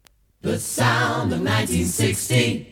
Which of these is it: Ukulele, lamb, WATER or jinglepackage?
jinglepackage